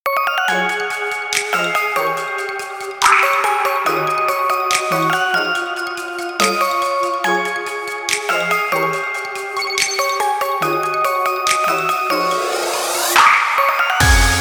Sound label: rainy drop 142